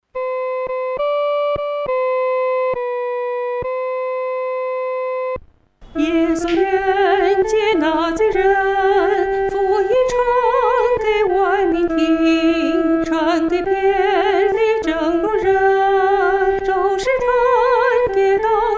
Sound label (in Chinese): 独唱 第一声